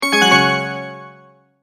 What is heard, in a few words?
Multimedia Tone-Return/Back